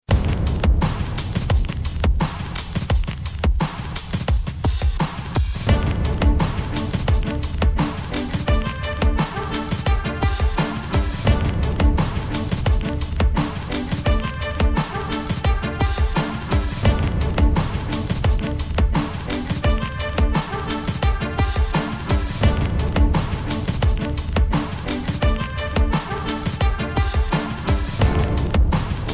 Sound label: Trumpet